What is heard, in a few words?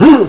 pain; action-quake2; quakeguy